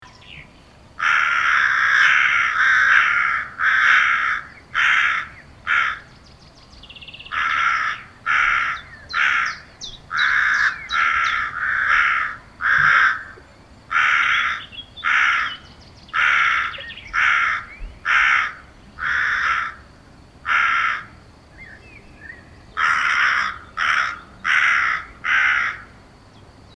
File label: voice of a crow nature